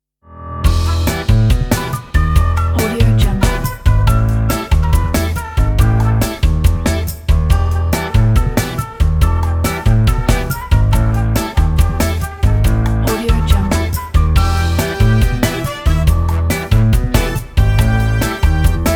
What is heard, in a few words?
groove